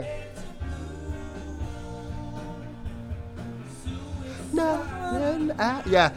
VOX Back

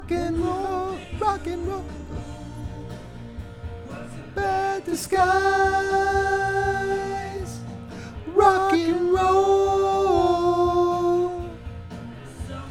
VOX Back

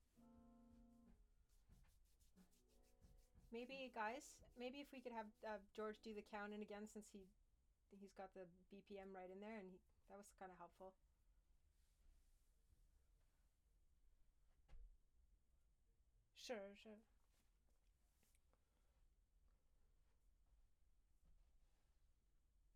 Act Guit R

